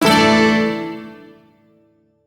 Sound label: Shawzin Pent Maj Chord C; Warframe; Duviri